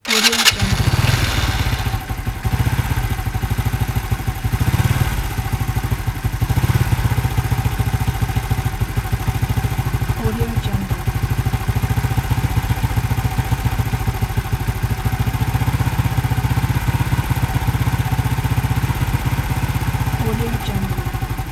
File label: motorcycle starts